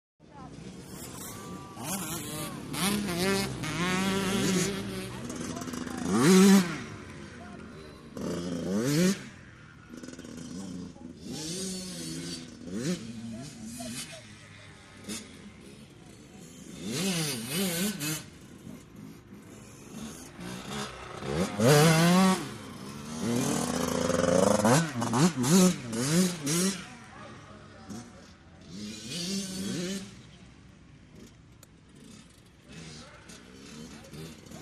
Motorcycle Motocross; Pit Ambience 80 Cc Pit Ambience 80 Cc, Bikes Idling, Revving, M / S Fast Bys From The Track, Shot Cu From The Pits.